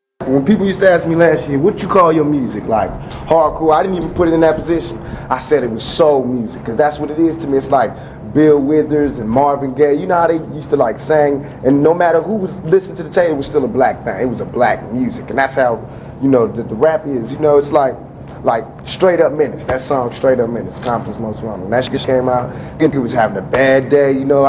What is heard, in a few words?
Rap Music